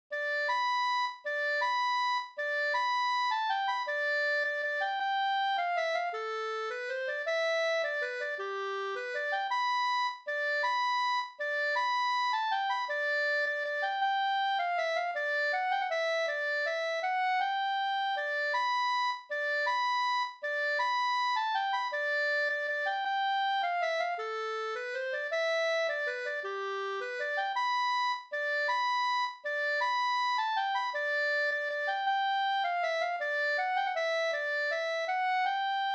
vals G dur; Dalby

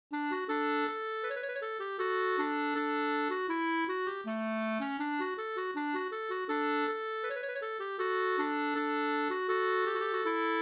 ganglat; Carl Ling

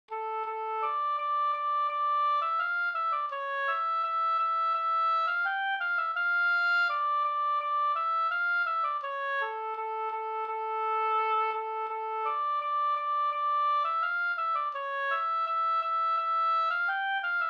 brudmarsch

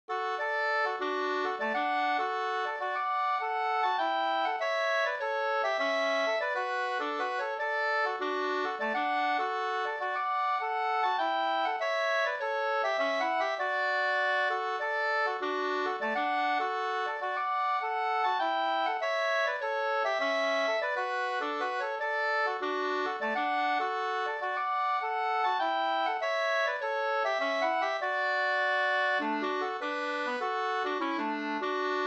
hambopolska

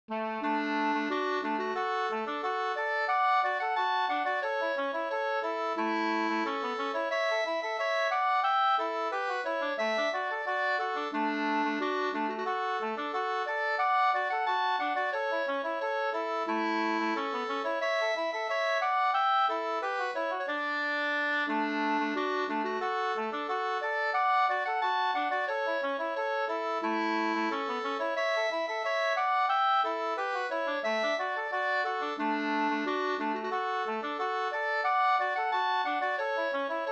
ganglat, 2st

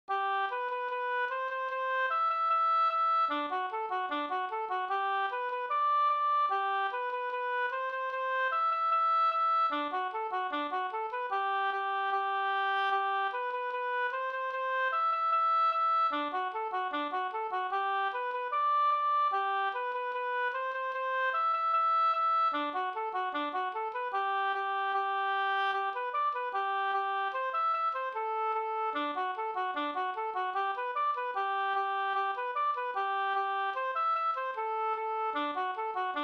schottis G dur

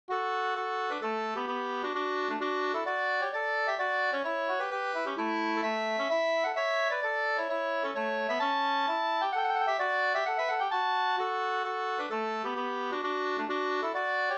polska

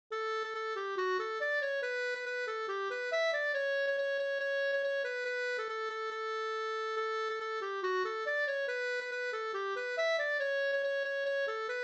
polka D G dur

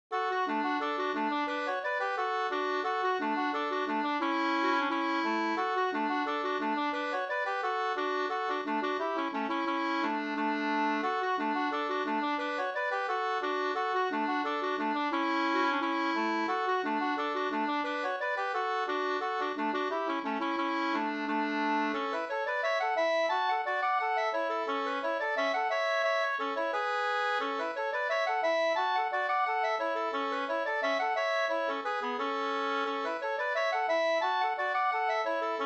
engelska; fr Tolg

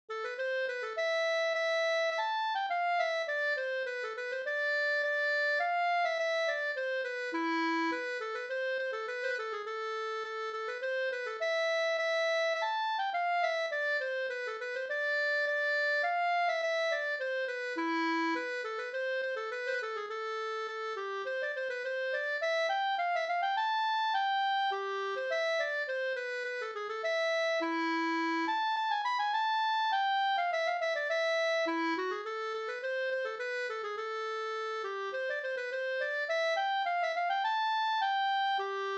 polska Brudpolska

